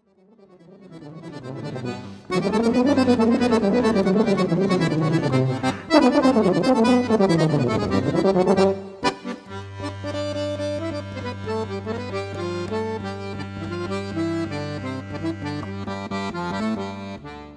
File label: choro